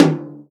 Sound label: tom, Real Drum Kit, jungle kit